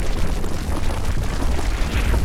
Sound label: charging fallback, Magic